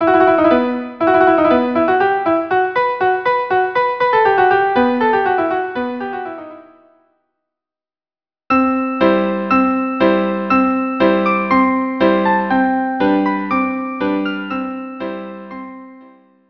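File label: violin duo; Anvil Chorus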